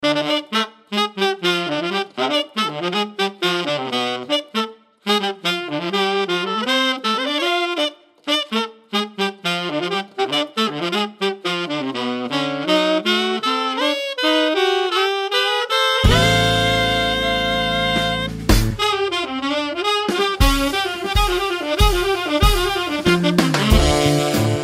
Jazz

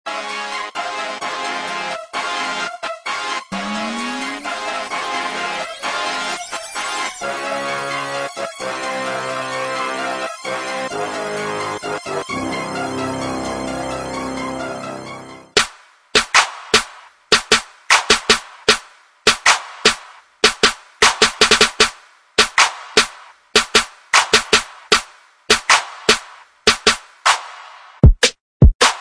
hip hop loops

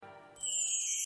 short bells alert; ringtones; sms

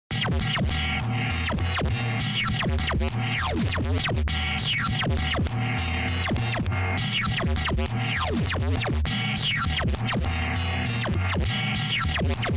cool dance Ringtone

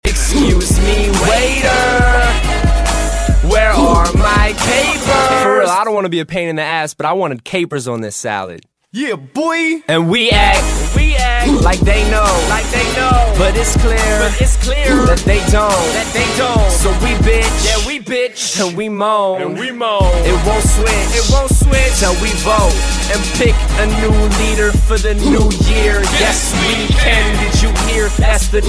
Ringtone

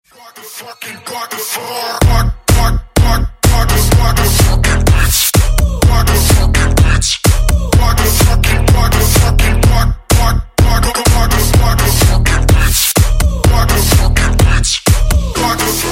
Beats Remix

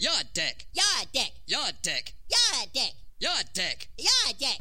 Inspirational

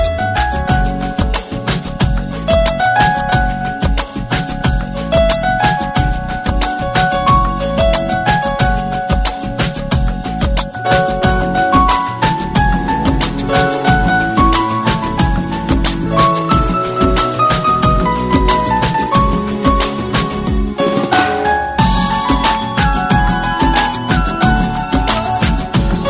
piano instrument

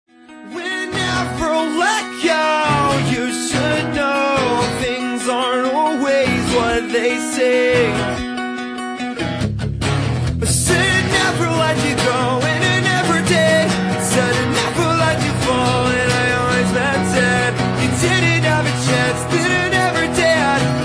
acoustic

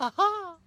Jam

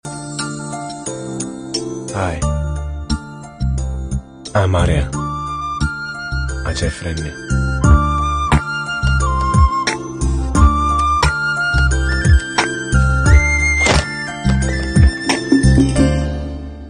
flute

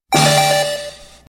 Notif

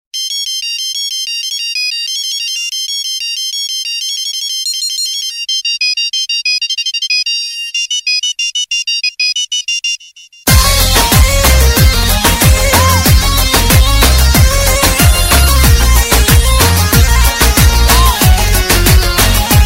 turkis flute; dance

